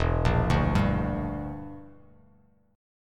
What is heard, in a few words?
E Minor 7th Flat 5th E; arpeggiated